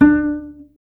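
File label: string contrabass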